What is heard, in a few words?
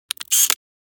Camera point shoot zoom focus